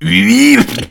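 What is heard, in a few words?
zombie pain; garrysmod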